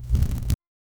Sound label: envelope getting burnt